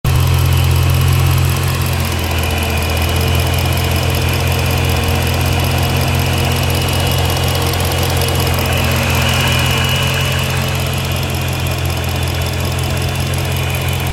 engine noise; XK8 XKR X100

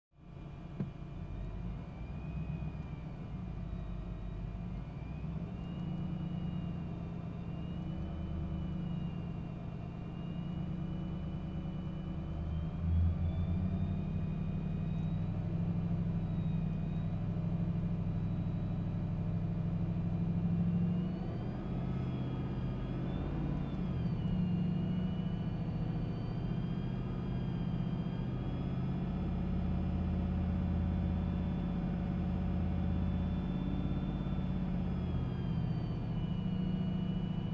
whining noise jag whining

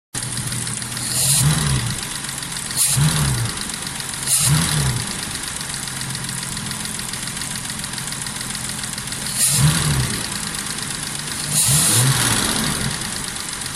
tappet noise, Jaguar; MKI MKII S-Type 240 340 Daimler

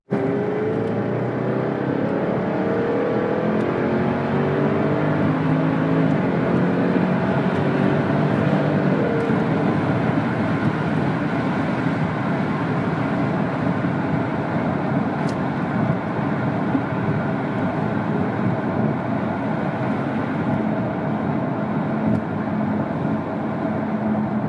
jag acceleration deccleration, v8 sound; F-Type X152

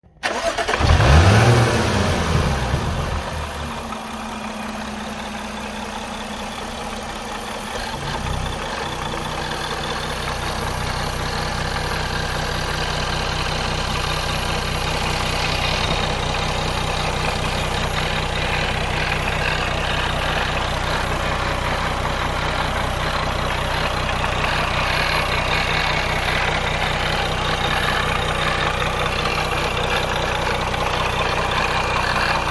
jaguar f type 3.0 irregular rattle

